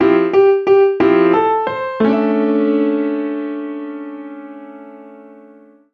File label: piano short